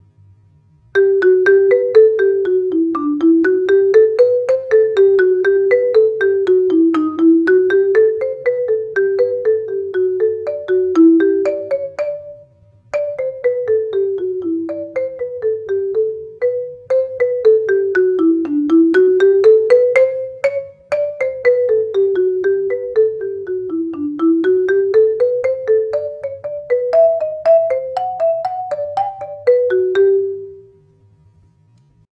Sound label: percussion ensemble cycle mallets 60bpm